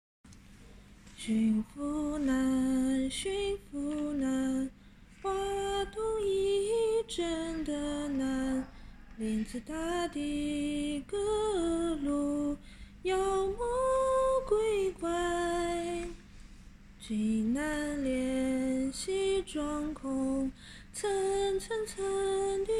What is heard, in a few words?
sing